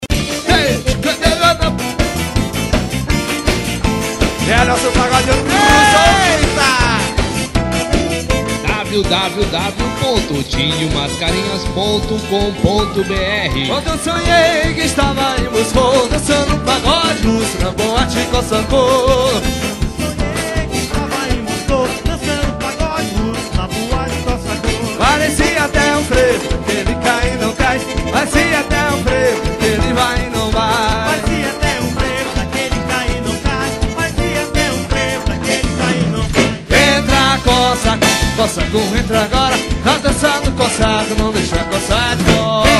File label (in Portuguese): forro pagode